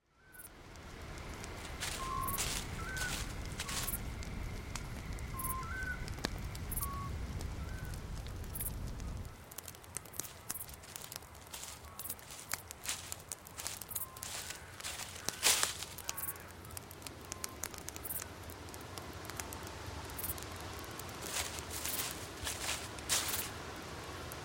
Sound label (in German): Geraeusch Nachtatmosphaere mit Schritten, Feuer; Klang